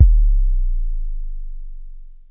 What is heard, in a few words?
Roland SH-09 Bassdrum